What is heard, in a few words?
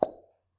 plop, bubbles